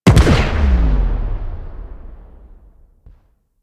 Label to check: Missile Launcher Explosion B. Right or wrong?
Right